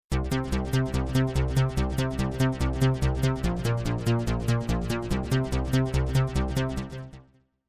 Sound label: Bass